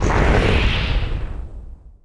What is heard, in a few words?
die demon